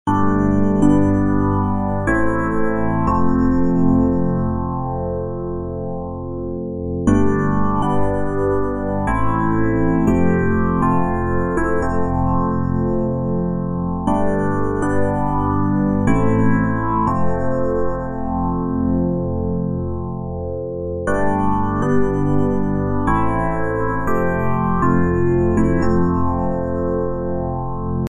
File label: EPIANO; EPSTATION